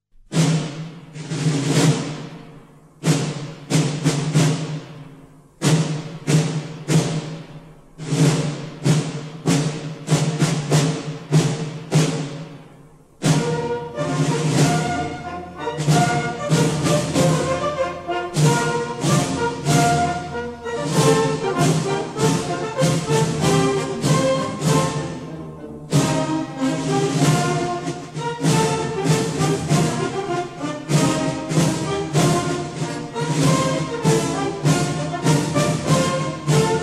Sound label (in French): Marche Grognards; Napoleon; hymnes Napoleon